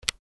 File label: Citizen calculator single button press